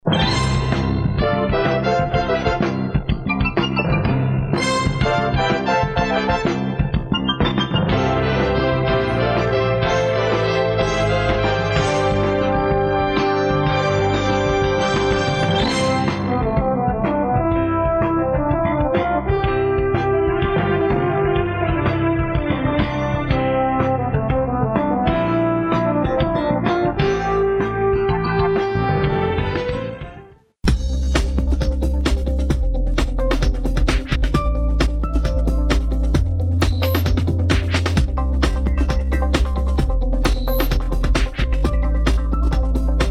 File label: industrial